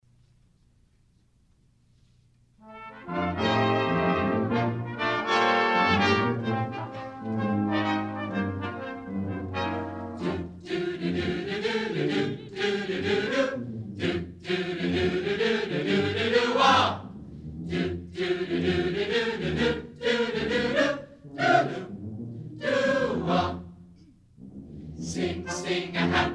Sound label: Chorus; Sing a Happy Song